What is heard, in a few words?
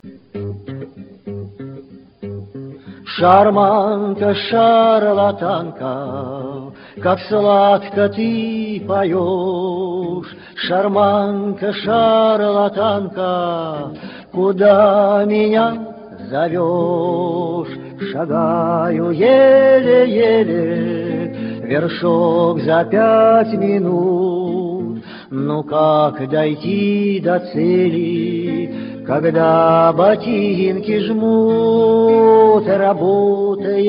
organ; Old Street-Organ Player; Старого Шарманщика